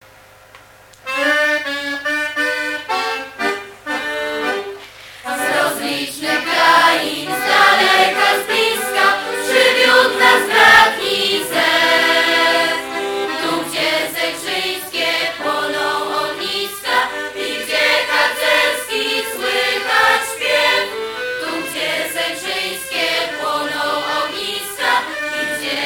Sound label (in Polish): DZIECI